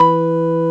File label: ORG E, M1 ORGAN; Best Service ProSamples vol.10; House; AKAI